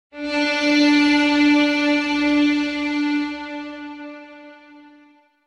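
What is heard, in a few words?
sounds of endings outro, disappearance fading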